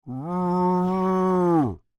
Creature, Human, Moan, Med

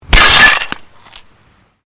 Glass pane puncture; Material components for constructing more complex sounds

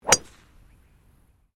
GOLF DRIVE DRIVER; Various Golf sounds; Sports

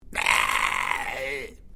Created Creature Small Call, Alien Sound Effects; Created creatures, vocalisations and pretend speech

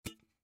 Can Pick Up Aluminum Empty; Soda; Foley; Pick Ups; Sound Effects:Objects; SoundEffects-Blastwave

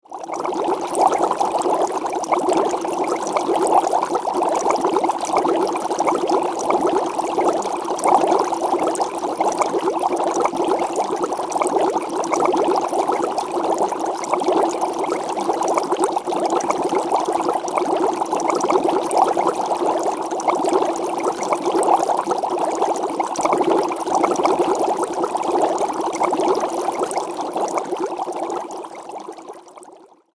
Water Boil Bubbles; Nature

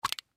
Silly String Lid, Silly String Remove Lid; Objects; Foley; Blastwave

